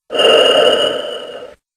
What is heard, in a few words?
breathe